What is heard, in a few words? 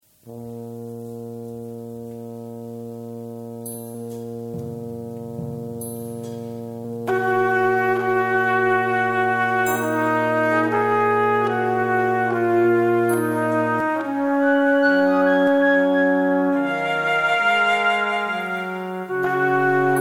eiswalzer